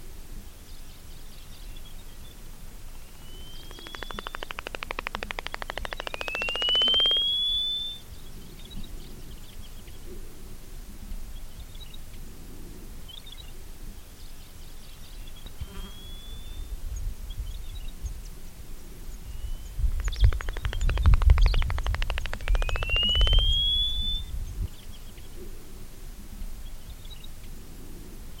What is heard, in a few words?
cape clapper lark mirafra apiata